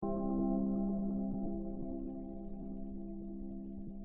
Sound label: harp test